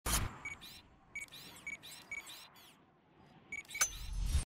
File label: gui scrolling through map; rise of the tomb raider